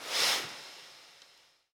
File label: Breath